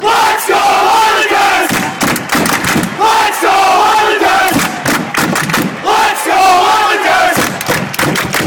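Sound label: chant, football chants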